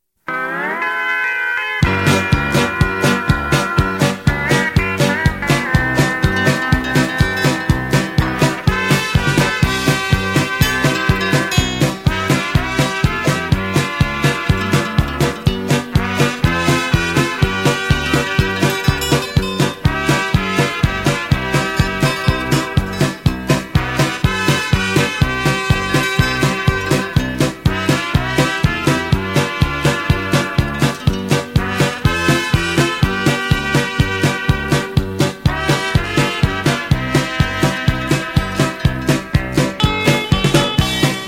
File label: music